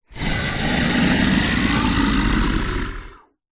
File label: horror monster growl wide stereo; zapsplat